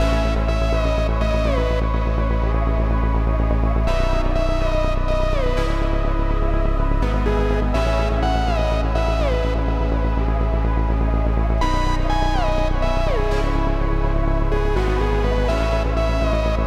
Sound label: 124 BPM C# Min, Melodies, Euphoria; Cymatics; GHOST (Beta Pack); Come Back